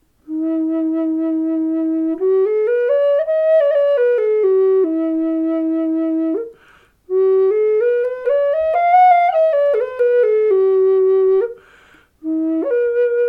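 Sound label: E4 Quilted Maple A430, Native Style Flutes; Ugly Boy Flutes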